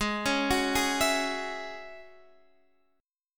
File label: Ab 7th Suspended 4th Sharp 5th Ab Guitar Standard; Ab7sus4#5; x,11,11,11,9,12; arpeggiated; chordshape; JGuitar